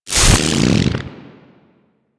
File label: Infested Arc Rifle Fire Stop C; Warframe; Weapons